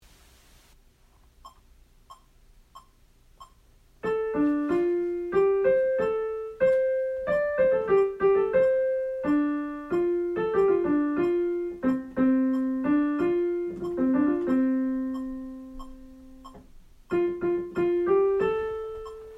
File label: Farm Ballad Soprano, Accompaniments